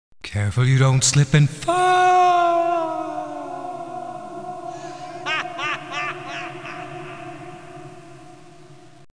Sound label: slip and fall; stauf